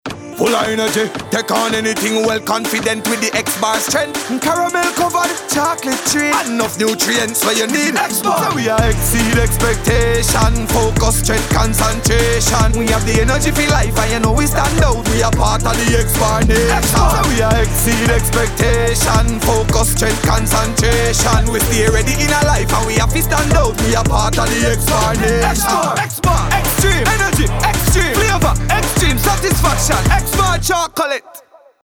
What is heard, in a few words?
Jingles; Esco Xbar; Jamaica’s top chocolate brand